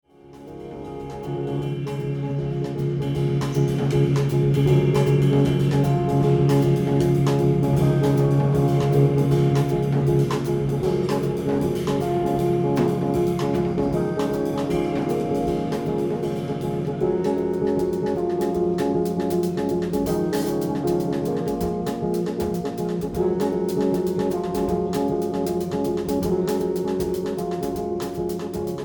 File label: outro